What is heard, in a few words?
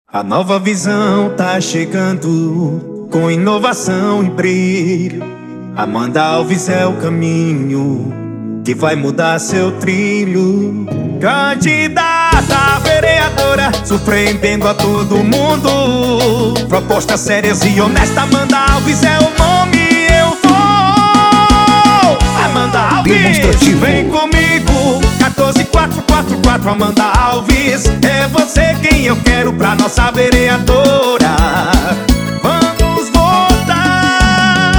Jingle